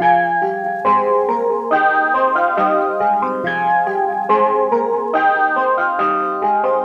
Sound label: Nostalgic Melody Loop - 140 BPM F Min Keys; Melodies; Loop Stems; Cymatics; Oracle Sample Pack